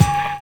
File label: BOSSCRATC; Univers Sons - Kitch 70s